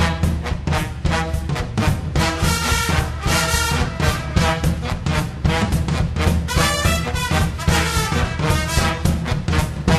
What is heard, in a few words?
swing jazz